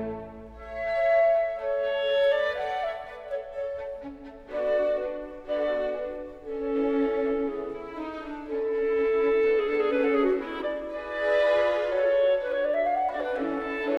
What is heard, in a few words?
clarinet